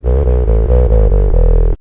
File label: 140 bass